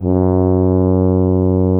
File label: tuba loop note